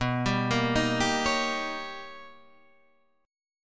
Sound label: B Augmented Major 9th B, chord; B+M9; arpeggiated; 7,10,8,8,8,9; JGuitar